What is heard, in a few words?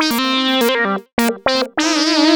lead (brass)